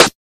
2000s sizzly steel snare drum g key, snare one shot tuned to the G note; snare drum samples